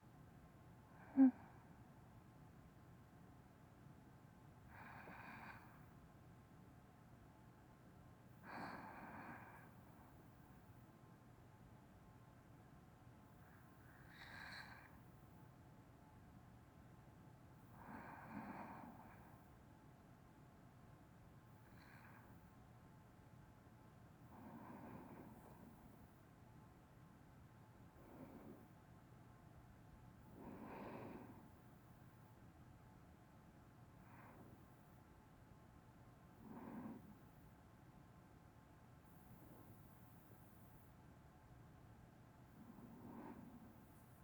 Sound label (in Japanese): 個室B寝台で寝息ループ